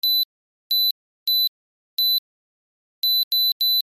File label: Alarm System Keypad Entry Enter Code Building Security; Office; Blastwave